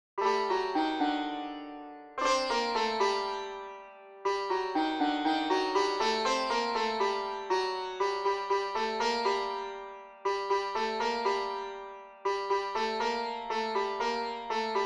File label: santoor